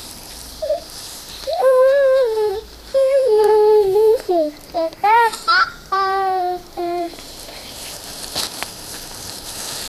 quick montage of a babygi, barefoot

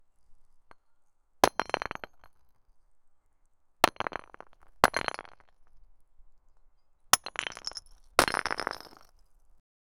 stone bolder smashed into; fragment

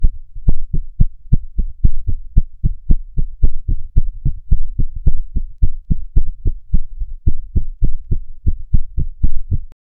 fast heartbeat

